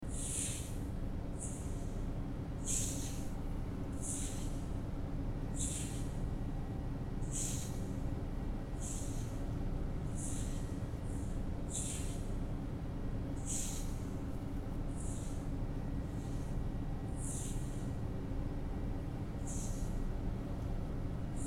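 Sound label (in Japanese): 耳かき（綿棒・左耳） ASMR; いせいこうりゅう 相部屋、無気力小柄な水星人と。; 地球の流行り・耳かきしてみた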